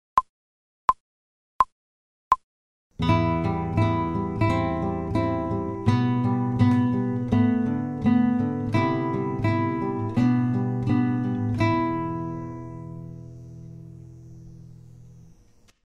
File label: Groovin fast